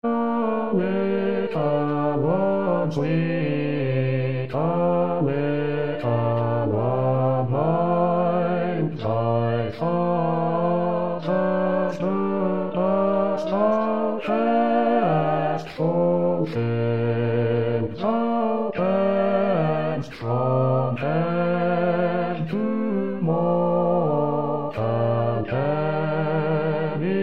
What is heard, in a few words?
Sweet Bass; 2014 xmas; O Little One Sweet